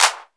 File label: Handclap